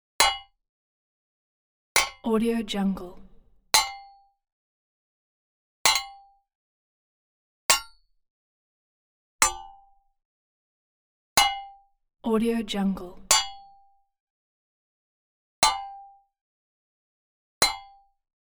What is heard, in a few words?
weapon spade large impact dull; gore source; soundmorph